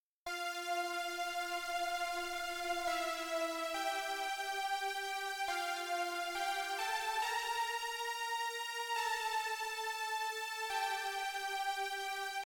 St アタック速い

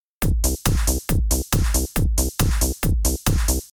ベース 短い

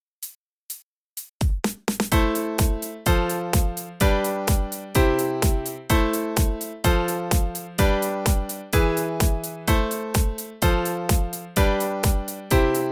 サビ

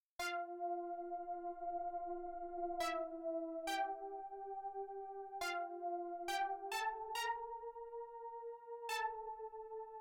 St カットオフ

